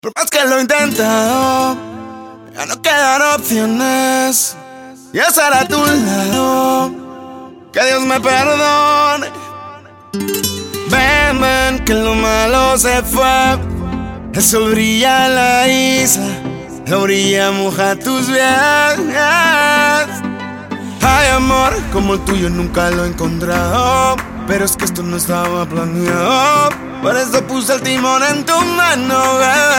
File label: Flamenco chill